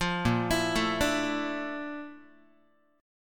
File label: Bb Major Sharp 11th Bb down Guitar, chord; arpeggiated